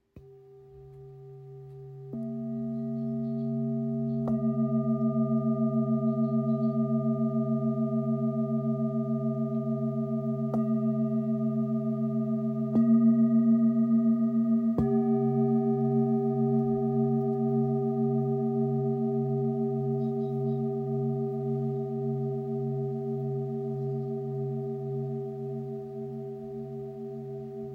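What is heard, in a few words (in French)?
Set, bols chantants tibétains; signature mix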